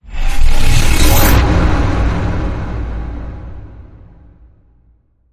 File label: Whoosh